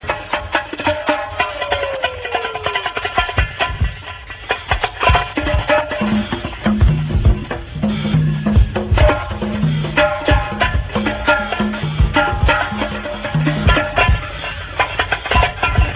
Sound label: Perc